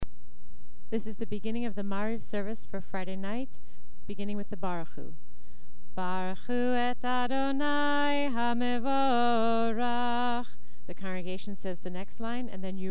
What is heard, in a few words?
Maariv Barchu and 1st blessing